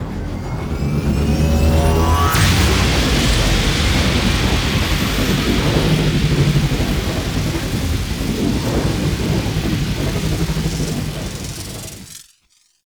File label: Ship Hyper Speed Tunnel